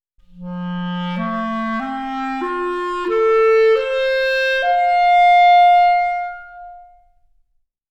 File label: clarinet